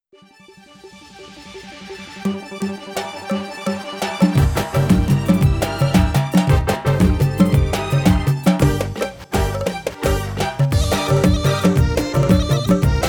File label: Khaleeji